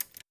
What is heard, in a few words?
minigame steam press